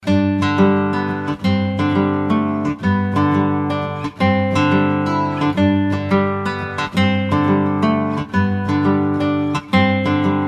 Indie Folk Romance; SafeMusicList

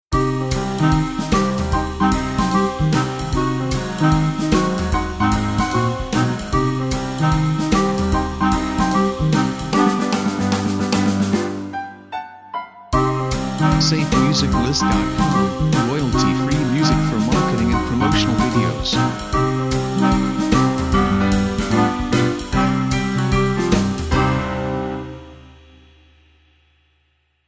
Malice And Mischief Intro; SafeMusicList

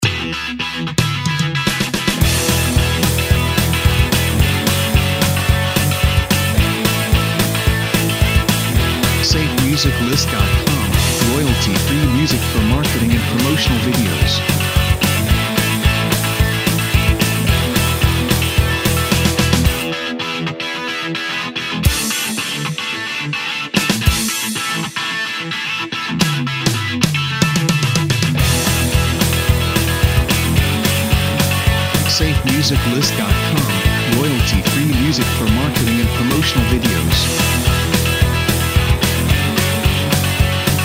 Wild Racer

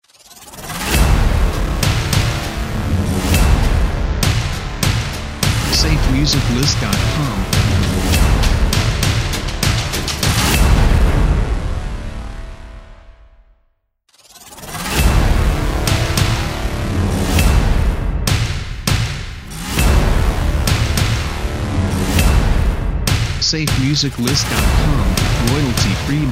Dark Action Hybrid Logo Openers; SafeMusicList

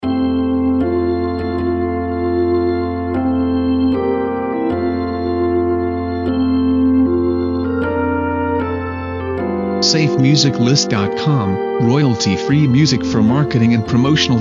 Here Comes The Bride Church Organ Loop; SafeMusicList

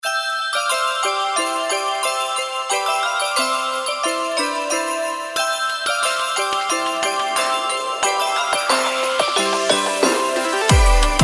Deck The Halls Electronic Mix; SafeMusicList

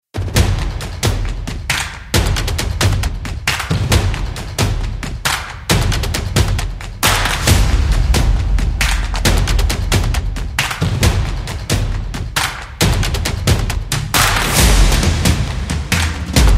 Cinematic Strikes; SafeMusicList

